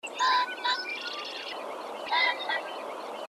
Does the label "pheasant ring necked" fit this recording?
Yes